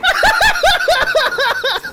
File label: laugh